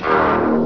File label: purple synth